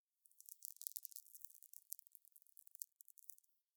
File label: TV Screen Static; SoundsCrate